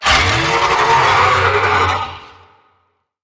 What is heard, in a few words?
Necramech Melee Idle; Battle Barks